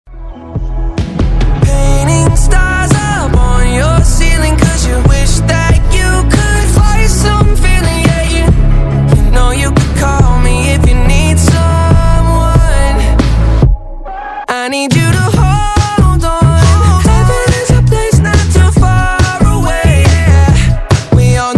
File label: english ringtone